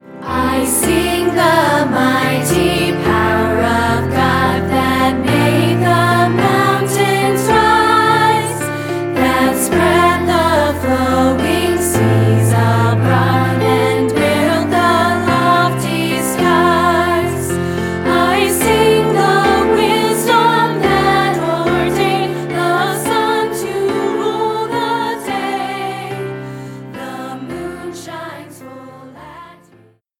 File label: Piano